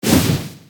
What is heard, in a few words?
Dodge hot